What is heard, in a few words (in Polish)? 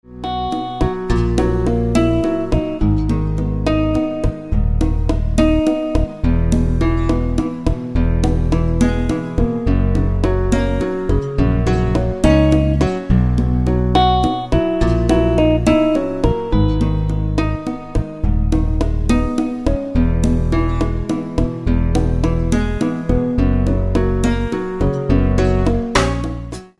muzyka bez oplat